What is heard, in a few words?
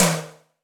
Rock Tom, Roland BK-5